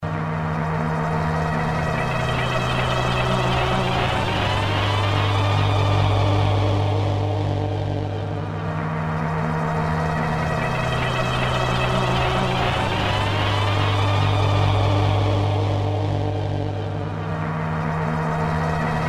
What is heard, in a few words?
pad, cue synth; tk cuesynth demo